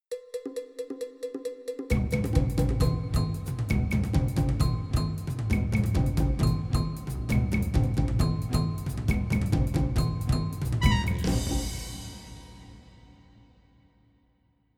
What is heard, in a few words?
Jungbeat